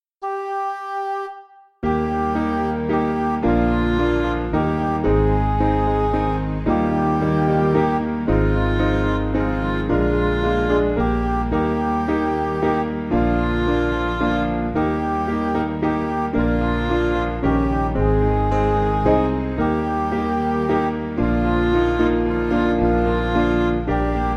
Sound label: Midi; Holy Holy Holy; Schubert